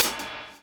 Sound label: DOWN, DOWN KIT 78